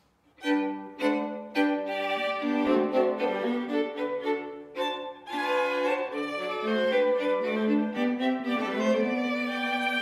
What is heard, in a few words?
trio; goepfart